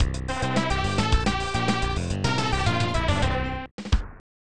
Unused Jingle; Soccer Kid; SNES

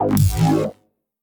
ParasiteStone Activate

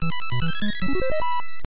Game Start; don doko don 2; family computer

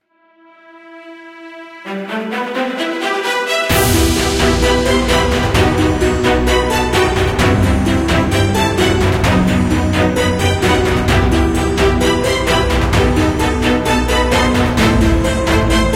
Transition (Intro); worldEnd

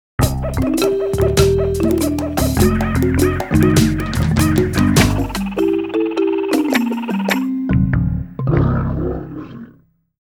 Jungle Zone Completion; Frogger He's Back

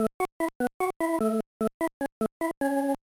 Jingle, gba; backyard baseball 2002

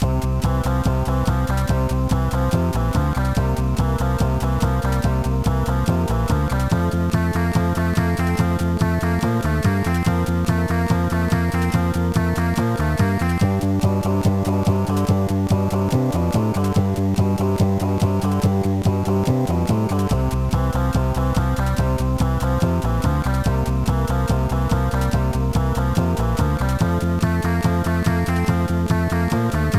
Level Completed; Jimmy Neutron Boy Genius; gba; Large Meteor

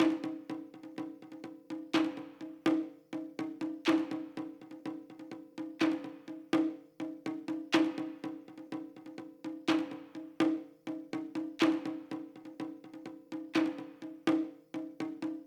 Drums; For Honor

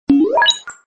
Laser Teleporter; Fernanfloo Saw Game Online

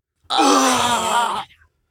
Stun

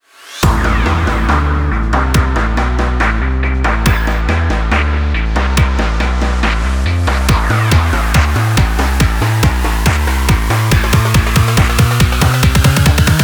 Edm 140bpm C; Clone Drone in the Danger Zone

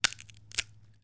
bone snap

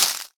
climbing ice; Scrat

